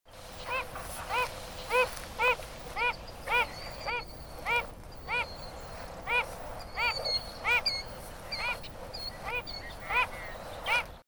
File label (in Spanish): falaropo, Phalaropus tricolor, Wilson`s Phalarope; cantos; Phalaropodidae; Falaropo Común